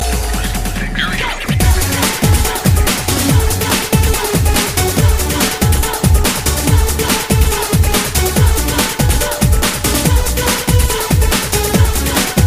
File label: Mini Mixbreak